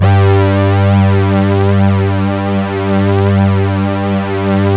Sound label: SYNTH PAD; Sound Gallery - Charged; SYNTH PAD 1